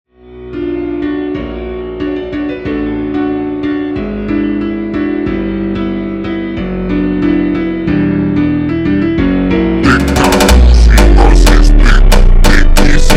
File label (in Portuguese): ultra Slowed